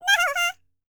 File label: C ASIA, World Voices